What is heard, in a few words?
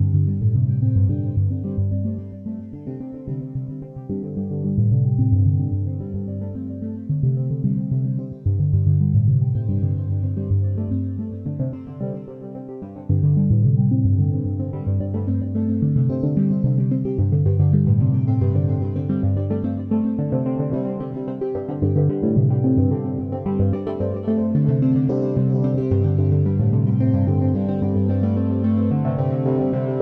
4 Voice 1 Filt, microfreak